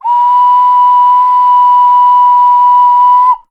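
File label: ocarina B5, Winds; Polyend; Simulacrum Sounds; 1 Shots; Acoustic and Electric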